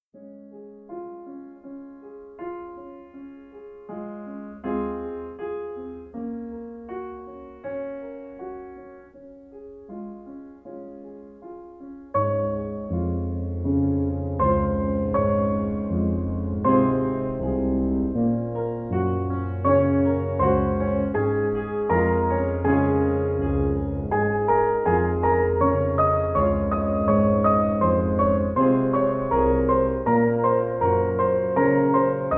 Chilled Jazz Piano

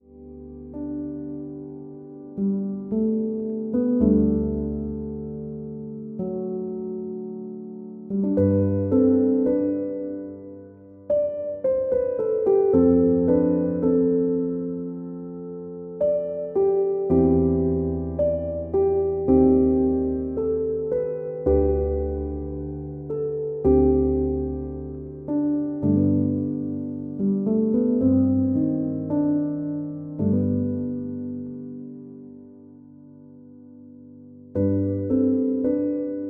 Piano

